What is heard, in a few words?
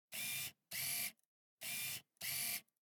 Camera, Pentax KS2, DSLR, electronic, mechanical, focus, steady, out in, XY; Eiravaein Works; Vaeyan IV